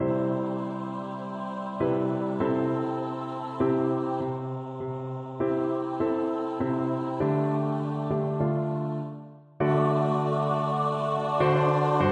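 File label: locus iste choir piano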